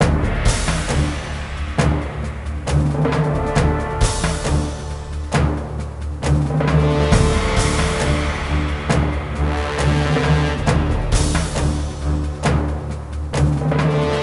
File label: Wizard Battle; Lego Battles 2009 NDS